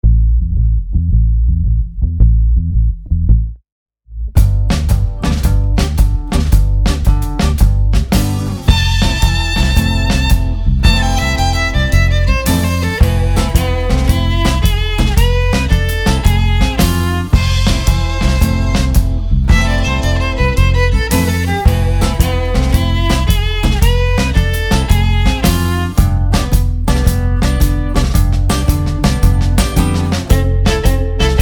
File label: World Music Bosnian Sunset; JamPackWorldMusic